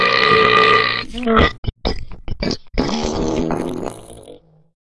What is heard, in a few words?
toilet; Проблемный пердёж